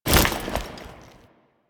Foley Step; Warframe; Kahl

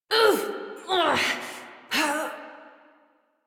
Death Adult; New War; Adult Operator

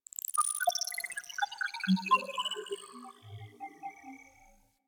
Beep Short A; Ambience; Interior; Warframe; Orokin

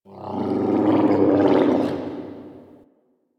interior moan gurgle; Ambience; Misc Indoor; DE; Warframe; Infested Eris

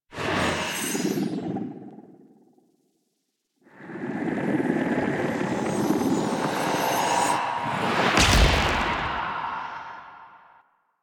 SFX Beam Fail; Cinematics; Operator; Warframe; The War Within; Mountain Pass

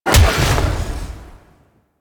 Melee Jump Large Slam Impact; Weapons; Whooshes; Warframe

